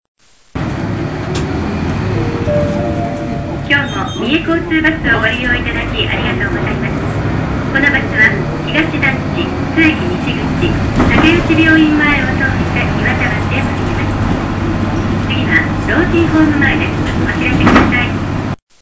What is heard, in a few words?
bus tape orgel; mie; sanco